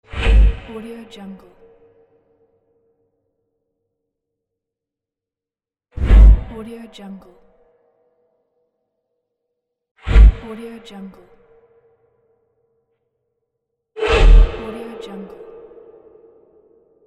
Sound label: sword whoosh